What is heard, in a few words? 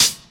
short high hat sound B key, hat tuned to the B note. Loudest frequency: 5773Hz; hat samples